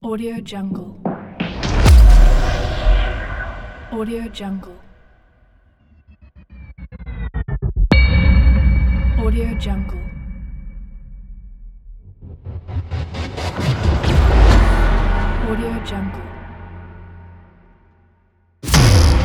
transition sound effects

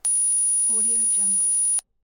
programming code running on computer

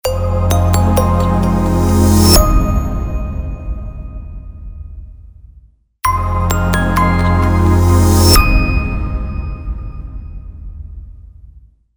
corporate logo

